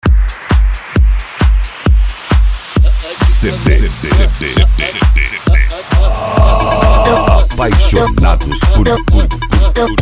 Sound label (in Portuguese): eletro funk